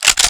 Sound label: sg552 bolt pull; weapons, gamefreak